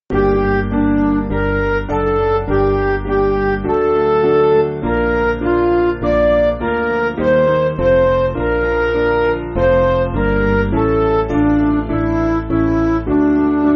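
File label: Music Backing Files for Churches; Hark My Soul; Campian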